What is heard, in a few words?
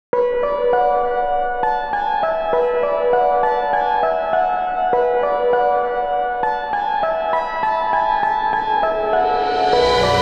Ice Cave; Adventures of Chris